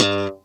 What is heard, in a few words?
PULL GTR G2; AKAI S-Series CD-ROM Sound Library VOL-2